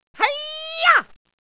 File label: Karate